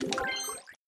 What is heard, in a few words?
single key hit bubble pop right answer